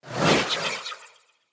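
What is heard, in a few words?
Staff Caster Projectile Whizz By; Weapons; Player; Staves; Starter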